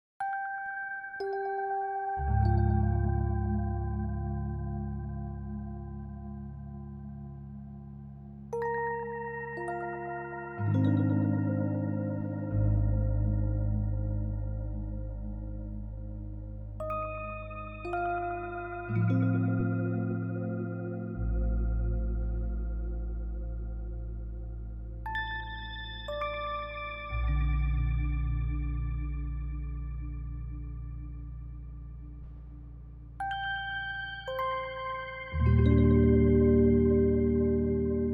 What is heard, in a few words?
glockenmaschine diving; schallenberg engineering